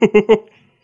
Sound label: heh heh heh, laugh; Voice; ooh ahh